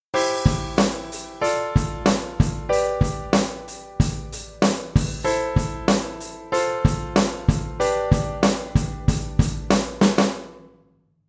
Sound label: drum chord